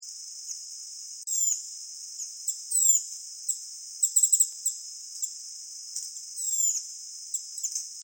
suara burung sepah kecil di tembalang

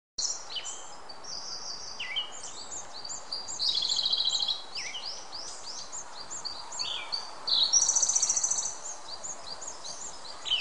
suara anis siberia

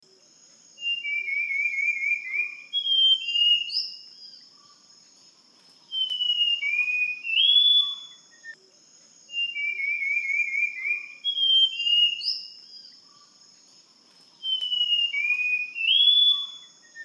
suara anis tanimbar

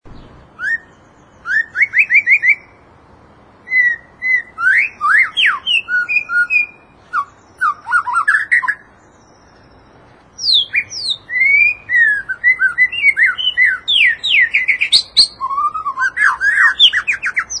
suara moderate murai

